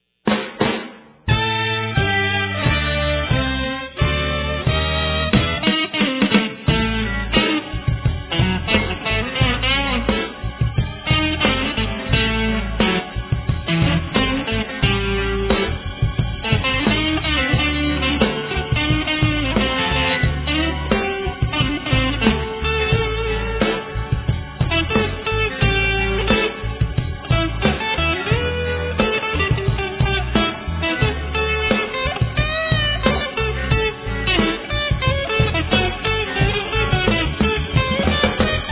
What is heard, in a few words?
Funky Blues